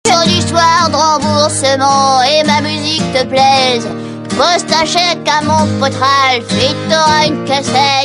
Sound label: jingle